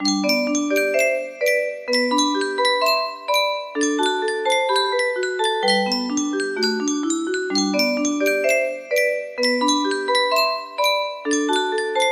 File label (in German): yunsheng spieluhr